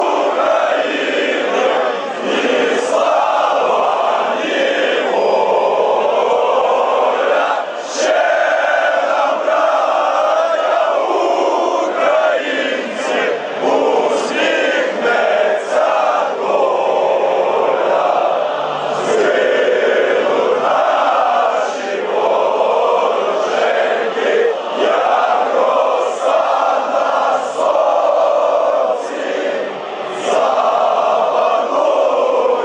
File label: ukraine national anthem fanchants